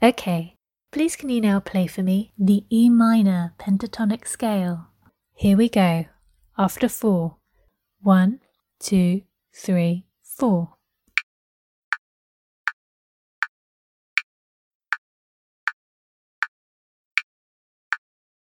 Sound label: org e minor pent scale